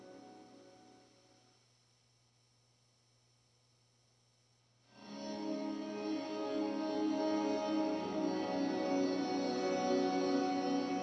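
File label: square drone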